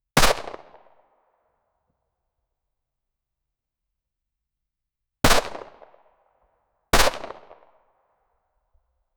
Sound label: shots from handgun